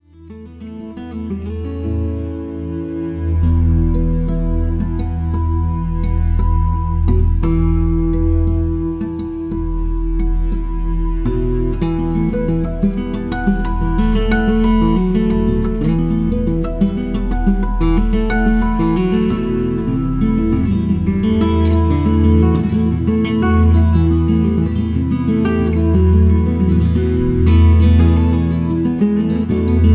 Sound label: Harp; Dagdas Harp